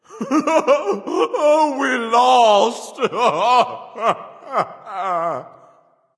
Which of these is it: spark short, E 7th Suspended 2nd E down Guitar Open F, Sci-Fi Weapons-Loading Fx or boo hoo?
boo hoo